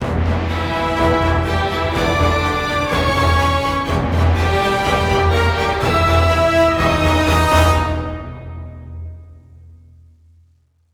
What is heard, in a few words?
Sting; Evil Genius 2 World Domination; PS4; Xbox Series X/S